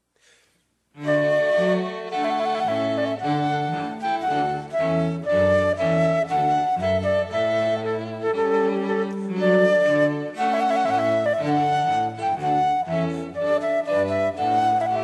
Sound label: trumpet voluntary